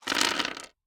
DICE on Hard Wood, Throw and Roll, Standard, 16 Sixteen Dice; Samples; ArticulatedSounds; DICE Sfx Party (Extended)